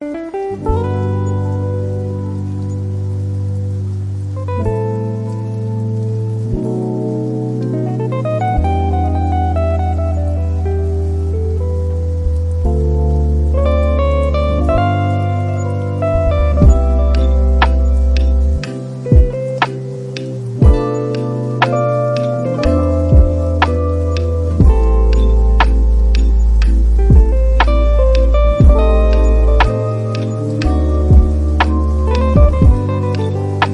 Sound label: Rain and relaxing beat